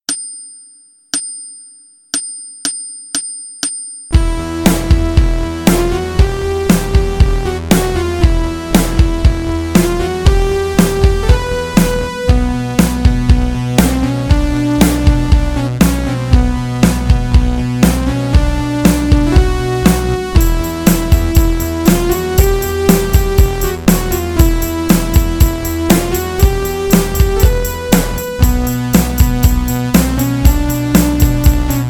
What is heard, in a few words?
One String Boogie, guitar